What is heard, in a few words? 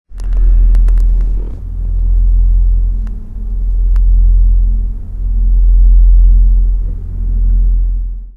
Bus cooler vibe; 空調振動音（非公式最前列客席・シート背もたれ部に当てて収録）; 神奈中; 空調使用時に特有な振動がします